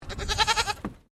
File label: Animal Goat Toggenburg Baa; Animals from Edgar's Mission farm in Victoria; Goat Sound Effects; Goats (Sound Effects:Animals); Foundation1